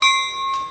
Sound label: Bell, Trains